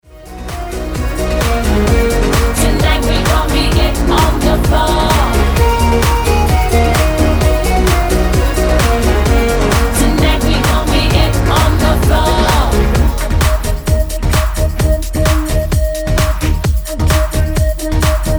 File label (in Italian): Remix Con Voce Rap Canto